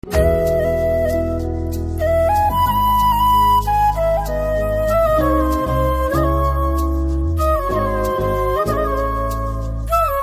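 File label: background music Telugu Ringtone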